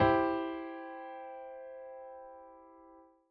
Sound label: inv C 1st, accompaniment inversion